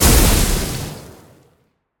casting spell fire

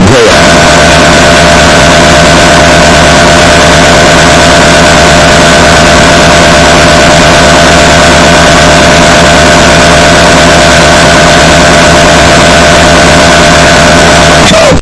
raging earrape

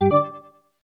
android nfc sound

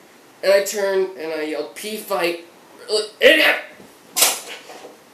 pee fight

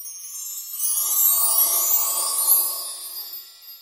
chimes

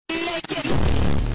making beats

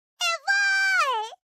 happy eevee, cry

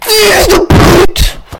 pure rage

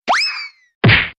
throw slap; plak

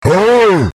raaugh

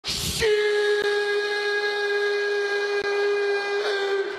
shuuuush

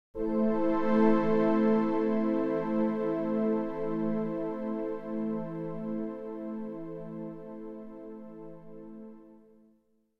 windows xp title

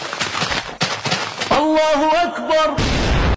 bomb explosion

